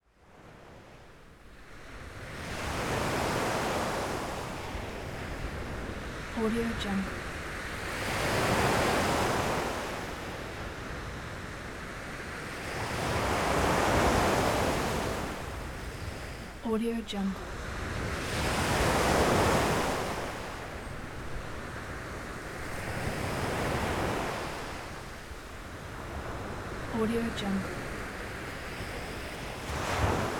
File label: mediterranean morning sea waves